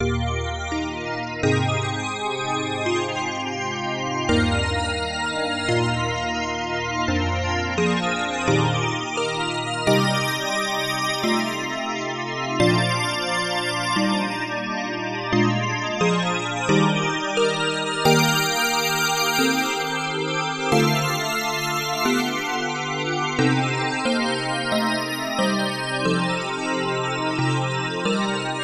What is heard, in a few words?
korg o1-wprox fantasy organ